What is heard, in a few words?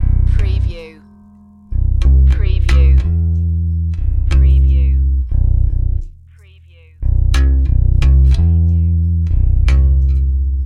Hip Hop Bass Guitar Loops 90 BPM - B